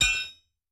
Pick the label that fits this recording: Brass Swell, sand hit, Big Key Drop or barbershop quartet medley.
Big Key Drop